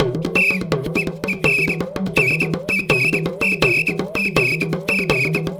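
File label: tama foudang groove; Best Service; World Colours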